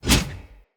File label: Cleaver Whoosh, Swords; Weapons; Player; Bromius Cleaver